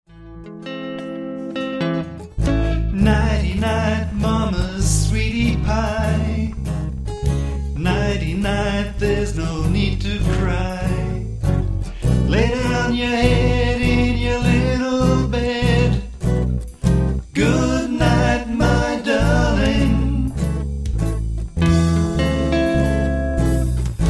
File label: Lullaby band example